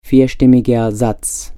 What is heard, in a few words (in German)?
4 stimmiger